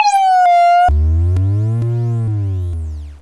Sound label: boss death; Station-0